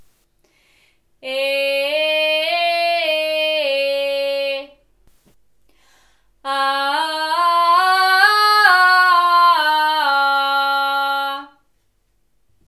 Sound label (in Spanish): Belting